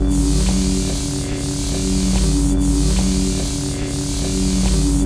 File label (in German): STROM FX; Best Service; Advanced Mega Effects